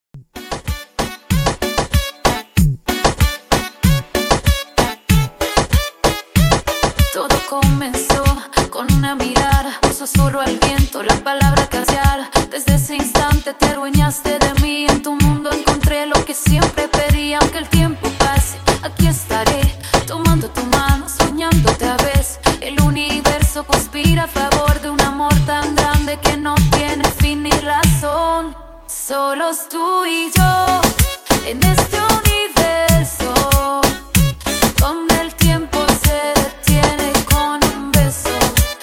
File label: Reggaeton 95Bpm; djyan